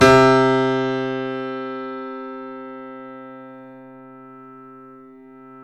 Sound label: C7; East West; The Ultimate Piano Collection